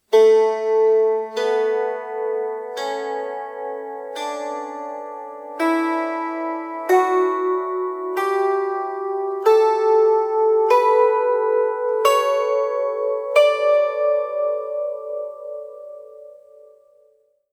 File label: minor switch